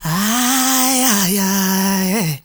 MALE SOLO, Heart of Africa; Spectrasonics; Heart of Africa 2; S.MALEDRY

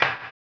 HAMBONE, Vocal Blues Soul

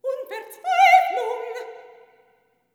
SOP. TRAD, Symphony of Voices; Spectrasonics

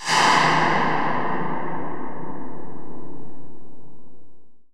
AMBIENT; ENVIRN-AMB

